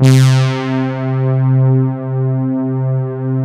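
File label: analog brass; Pro-Rec - Synthsonic; TRINITY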